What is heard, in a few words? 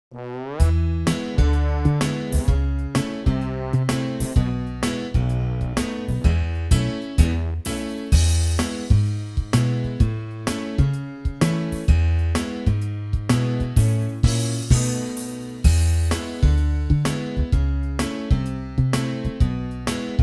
Its a baby backing; 2024 nativity